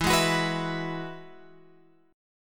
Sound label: E Major Add 9th; Eadd9 Chord {12 11 X 11 12 12} strummed